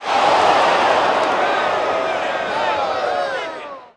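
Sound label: Boxing Crowd Small Reaction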